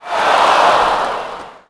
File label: NEG Reaction,Crowd; Cars; XBOX